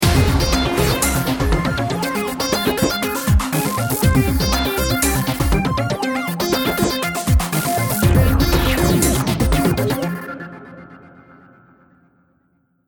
bomb ten sec count

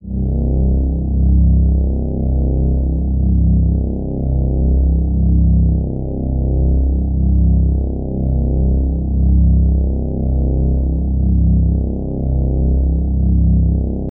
Booom; pokemon mystery dungeon rescue team dx gamerip

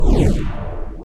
Attack Fly

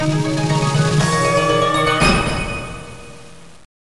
News Headline; WK Voetbal Avontuur 98

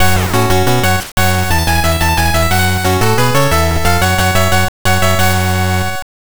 All Clear; mega man v rockman world 5 gameboy 1994 gamerip

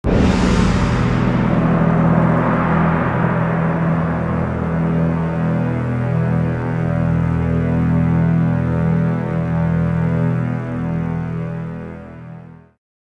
Shot Crash; hulk gc ps2 xbox gamerip 2003; Banner

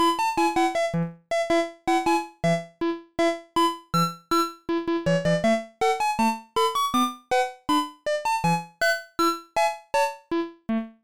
Jingle, CLASSIC FROG DOCK; Frogger Helmet Chaos; nintendo ds

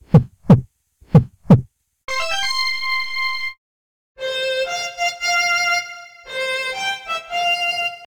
SFX Propose Success; life is a game android

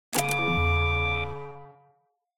Button

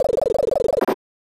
Dice; Super Double Yakuman; SNES

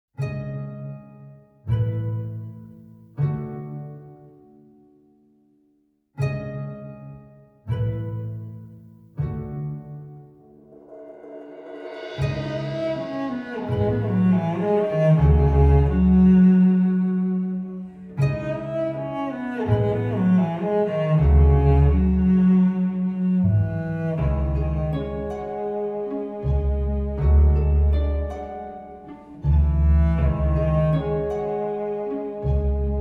Quiet Waves; Shin Sangokumusou 8; Dynasty Warriors 9; CHINA SYMPHONICA; フィールド · 海岸

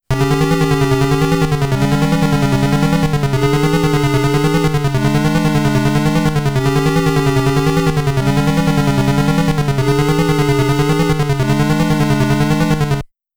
Warning

